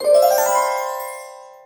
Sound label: jackpot confirm